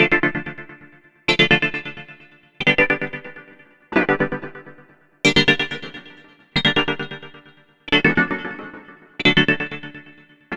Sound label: Synth Pattern; Electrocution